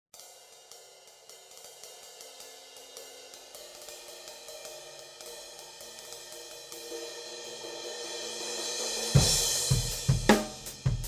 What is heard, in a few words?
Drum Overheads Torch; Lauten Audio Torch ST-221; Tube Condenser